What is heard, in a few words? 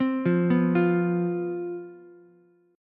C Major 7th Suspended 4th, down, Ukulele; arpeggiated